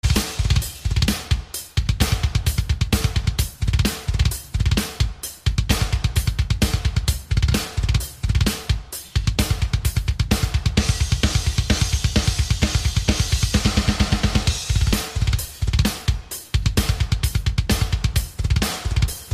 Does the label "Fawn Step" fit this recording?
No